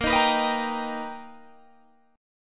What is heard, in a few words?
B Augmented Major 7th Bb, chord